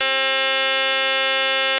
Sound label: 737 alarm